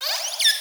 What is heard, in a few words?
Normal call